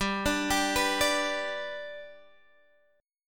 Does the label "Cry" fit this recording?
No